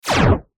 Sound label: Hit Laser Blast; Lasers